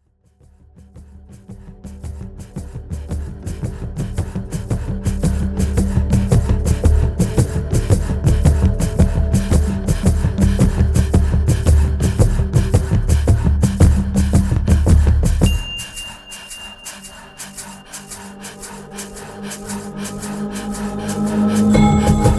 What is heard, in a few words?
Chakra Power Breathing